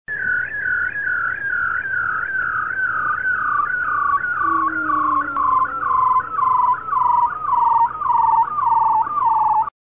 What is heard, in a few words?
seal